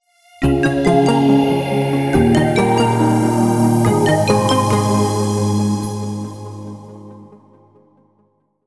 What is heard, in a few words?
Soft Logo